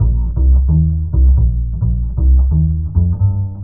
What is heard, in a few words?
bass, Loops